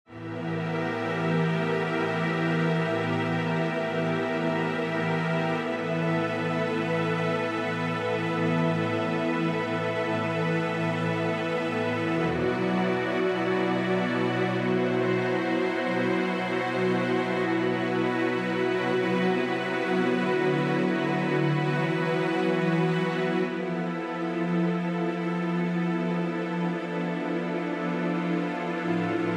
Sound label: Strings